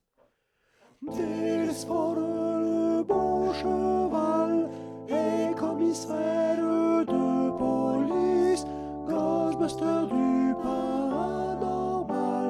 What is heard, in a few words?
alto